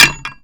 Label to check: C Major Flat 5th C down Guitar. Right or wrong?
Wrong